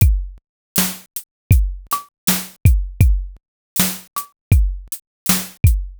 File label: lofi drum loop 80bpm